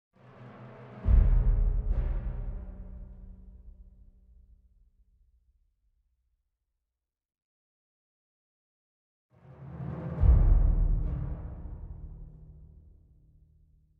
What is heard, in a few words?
World Music